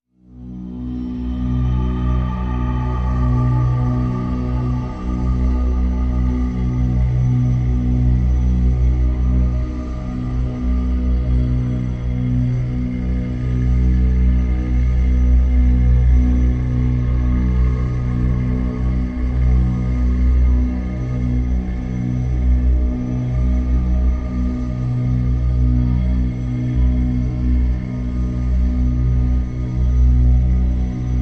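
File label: Drone Crevice B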